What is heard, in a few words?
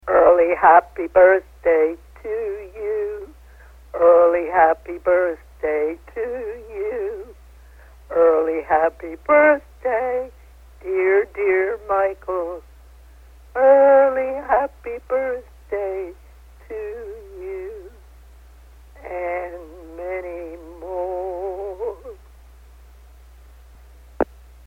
Birthday Song